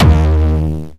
Jump kick, Jump hardstyle kick

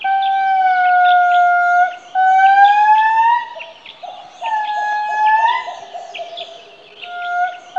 LEMURS, PRIMATES; Zero-G Ambient 1

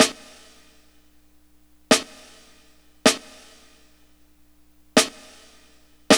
GRV, Guit It On; E-MU Formula 4000 Series Vol. 1; Hip Hop Nation; Guit It On 78.5

